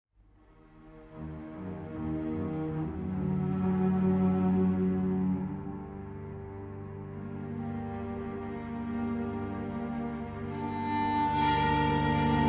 Croatian Chamber Orchestra

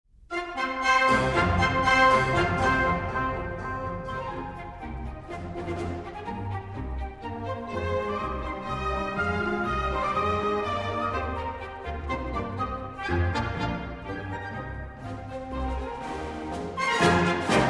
English Folk Song Suite, March; Seventeen Come Sunday

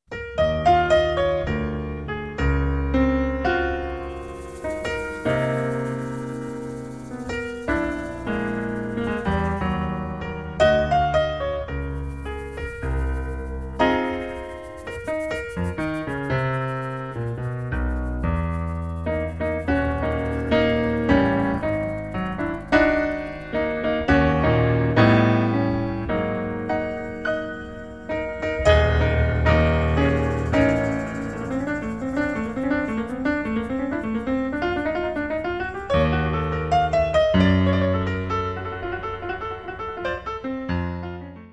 Instrumental

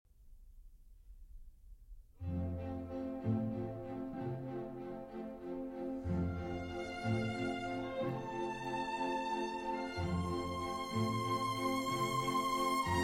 Piano Concerto No. 21 in C Major; Stockholm Sinfonietta

